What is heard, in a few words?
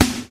noisy snare single hit b key, steel snare drum sample tuned to the B note